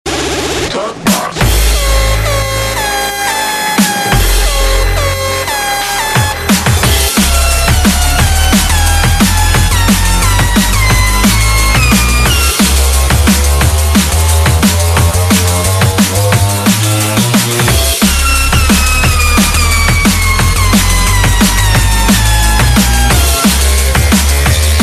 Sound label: drum bass